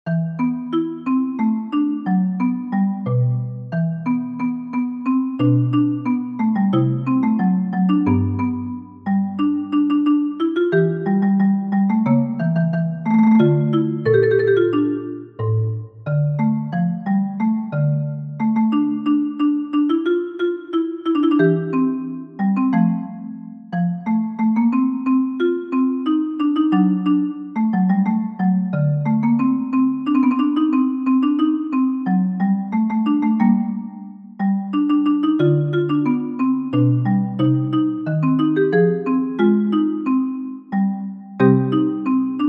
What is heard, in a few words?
recitative for marimba; bach johann sebastian; sie hielten aber einen rat